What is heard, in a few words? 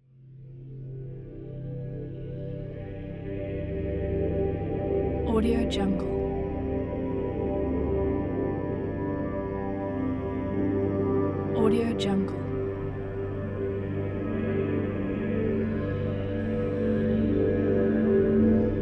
mystical creatures in the forest